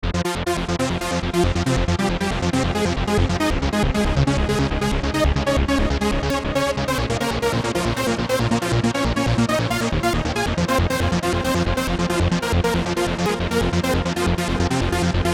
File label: trance